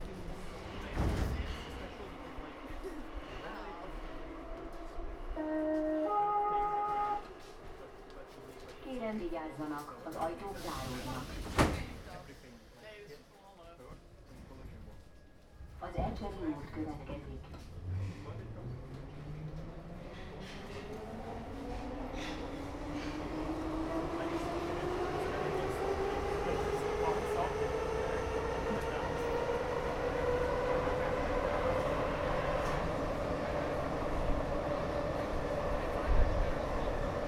Budapest Metro Line M3 Ambiance People Talking In Background